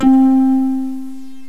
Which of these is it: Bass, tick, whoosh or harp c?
harp c